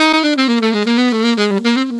Alto Sax, Alto Loops, Jazz Solos and Sections, Traditional; Sonic Foundry (Sony Creative Software)